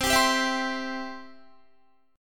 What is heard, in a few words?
C Major, Guitar; strummed